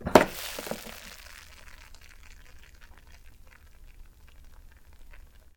rice cooker; cooking

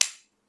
shogi

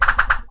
swish; attack

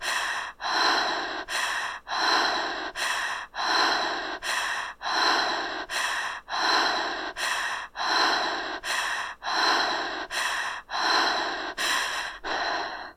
women hyperpnea; horror

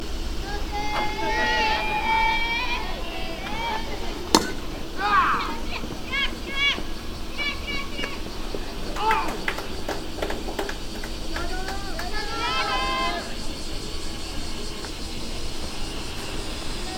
baseball boys; sports

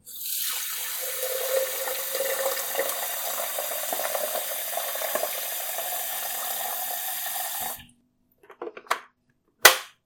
into water boiler; cooking

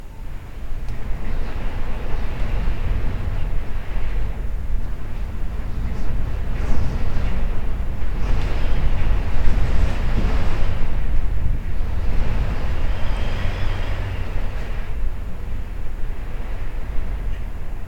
storm and window

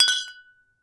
wine bottles; 瓶同士がぶつかる１

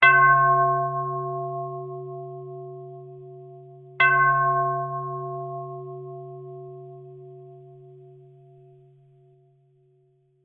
temple bell; jidaigeki

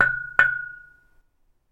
knock on iron tube; knocking

